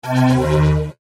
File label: Chime Multimedia; Web Interactive; Chimes (Sound Effects:Multimedia)